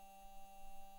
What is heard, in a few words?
mic, mars2020 edlcam